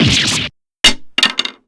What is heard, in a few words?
damage